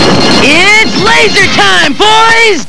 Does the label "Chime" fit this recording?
No